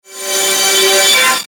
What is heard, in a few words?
Ascend Synth Swell Warble; Production Element Imaging Element Accent Transition; Ascending